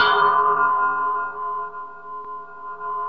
FLNG BOWL, METAL & WOOD; Roland - LA Composer vol. 1

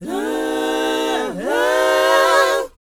BOYZ F, Vocal Planet R&B & Dance; ILIO

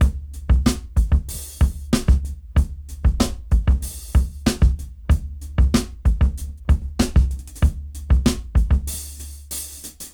Rap Dry 94 BPM; AMG - Keith LeBlanc Phat Butt Jams

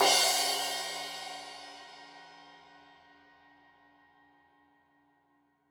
Crash 15in L edge, Cymbals Stereo

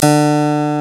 CLAVECIN, HARPSICH

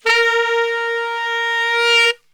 A#2 SAX; Best Service; Brass Super Section

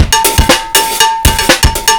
old, Loops, breaks; m8 breaks

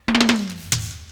drum fill; Crypton - DrumBase5000; RAG

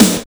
NASTY SNARE; Drums In Your FACE!

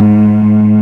CELLO, MELLOTRON; East West - Global Sounds

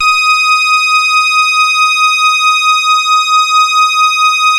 SAW, Synth lead; InVision Interactive; Keith Emerson; The Most Dangerous Synth and Organ

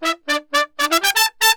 MERENGUE, World Grooves

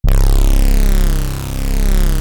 JD SYNTH C1; JD8ANALOG

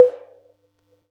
Yamaha EX-5

